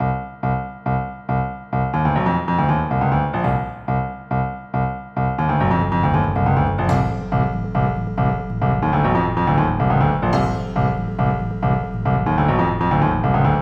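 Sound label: elec rock, adlib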